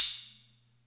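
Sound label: Atlantex Music Percussion Computer hi-hat open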